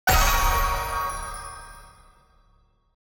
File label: ui button click; ahri; spirit blossom